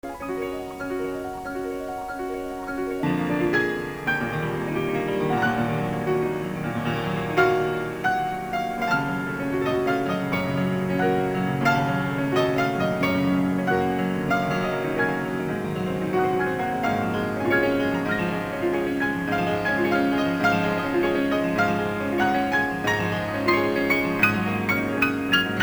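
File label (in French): piano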